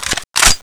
colt clip in, weapons, deagle; garrysmod

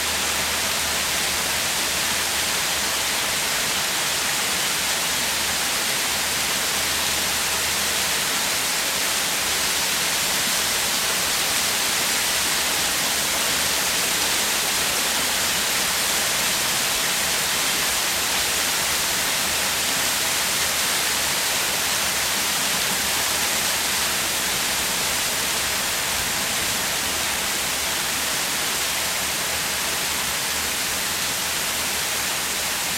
waterfall top; garrysmod; rp subterranean

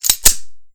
usp slide back; weapons; usp45; garrysmod

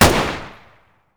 m9 fire, weapons pistol beretta92fs; garrysmod

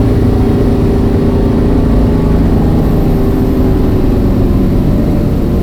third shift accel down, car; vehicles; garrysmod; sincar

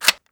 mp5 bolt back, weapons; garrysmod; mp5a5

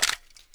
m249 box insert; mg; garrysmod

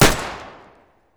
sterling fire, weapons; garrysmod

